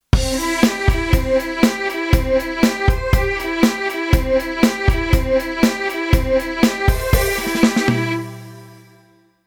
MIDI Track Delay Strings

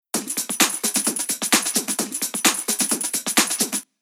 Rhythm Loop

